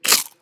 Potato Chip Crunch

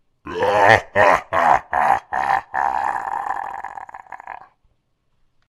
Evil Laugh

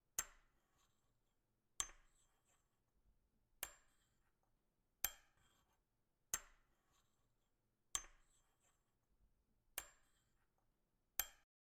Metal Hitting Metal Sliding

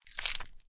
Squish

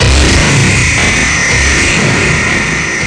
Jump scare

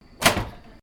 Door Open

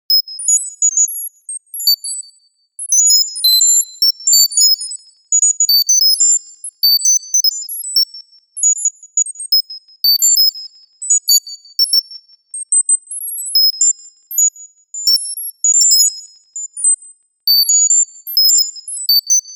Computer Beeps

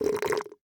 Slurp

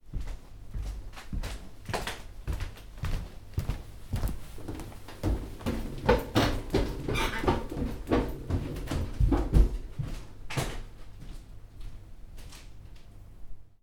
Inside Flat Interieur Appartement

